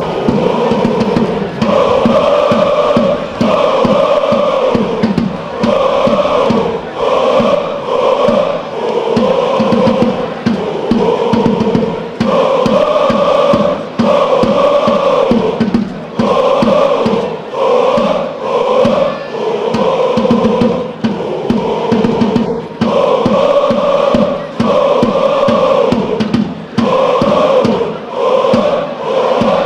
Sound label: cska chant